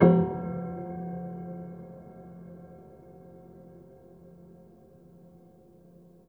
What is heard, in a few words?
Harm Ped Low, Piano Harmonics